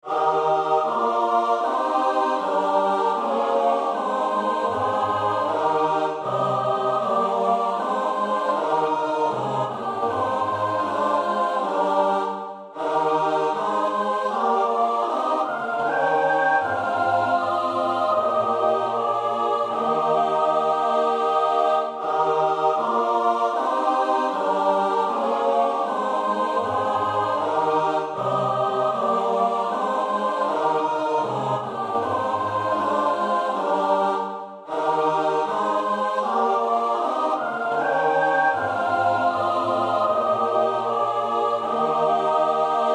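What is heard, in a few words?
soprano alto tenor bass; Bach (Johann Sebastiaan); BWV 245 Johannes Passion; Ach Herr, laß dein lieb'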